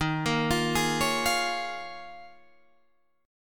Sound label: Eb 9th Suspended 4th Eb, Guitar DADGAD; 13,13,15,13,16,15; arpeggiated